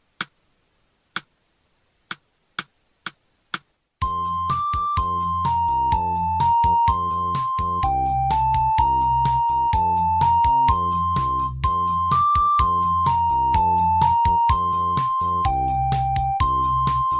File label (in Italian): base con melodia